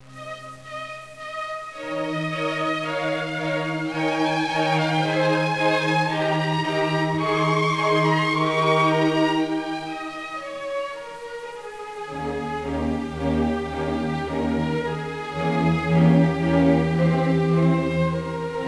symphony

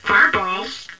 firebals

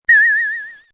cling; MOBICLIC 69

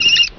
mouse; BESTSHOT; noisybit

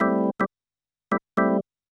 SYNTH HOUSE 125 A, Keys; eJay

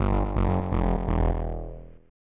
game over; AMOS Music

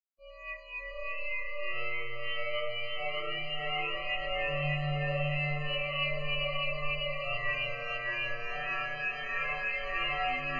soundscape; Becher Tycoon; SkiFi - THE MARS MAN

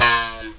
Coin Toss (buzz stick); Widget Workshop; Maxis

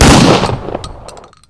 shotgun, shotg; KINGPIN; weapons

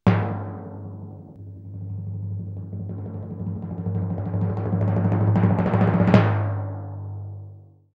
Timpani Drum; Kai's Power SHOW; Business Show

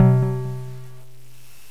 CHRD S, acordes

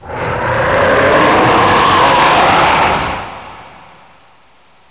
fake die; Hexen II; eidolon

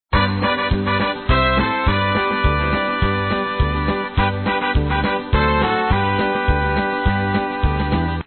music; Toboggan Run

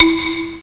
message; Virus Executor

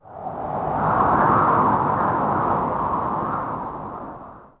wind; DAoC

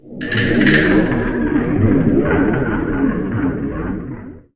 Rap

